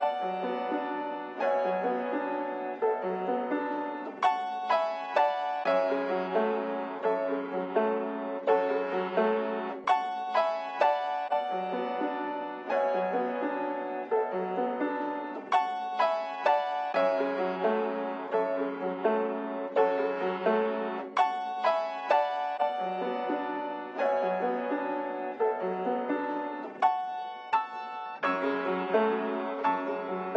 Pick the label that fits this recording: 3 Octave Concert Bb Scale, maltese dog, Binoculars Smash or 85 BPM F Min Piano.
85 BPM F Min Piano